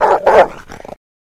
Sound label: Rottweiler Vicious